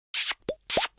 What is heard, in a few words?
China Click Sound; Jolly Battle